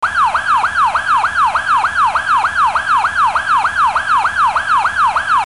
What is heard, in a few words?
Fire Siren; Sirens